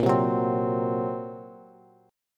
Bb Suspended 2nd Sharp 5th Bb Bass; 18,15,16,17; strummed; chord shape; JGuitar

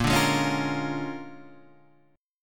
A sharp 7th Sharp 9th A sharp down Guitar Drop D

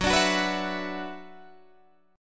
Gb 7th Suspended 4th Sharp 5th, Guitar DADGAD; chordshape; down

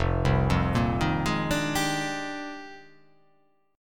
F Minor 13th; Fm13; arpeggiated; 1,3,3,3,3,3,4,4; JGuitar

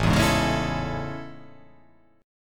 A Major 7th A

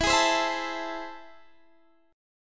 A Minor Major 7th, E

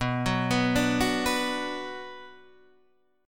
B-Minor, Guitar, Half Step Down

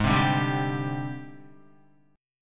Ab Major 7th Flat 5th Ab, down, Guitar; strummed; JGuitar

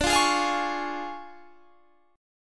D Minor Major 7th Flat 5th, D, Guitar Standard; DmM7b5 strummed; JGuitar

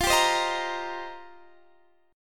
F 7th Suspended 2nd Suspended 4th, Guitar Open D

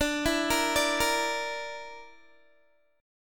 D Suspended 2nd Sharp 5th D, Guitar; Dsus2#5; arpeggiated

